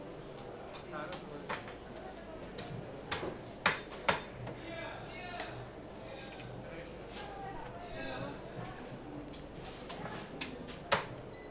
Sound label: Butcher shop